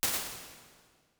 Guitar Plate, Lexicon 480L Reverb impulse response; Big Gee's